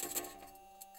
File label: mic, mars2020 edlcam